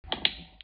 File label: ball break